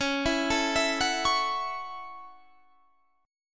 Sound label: F sharp Minor 7th, guitar; chordshape; 21,19,19,21,19,21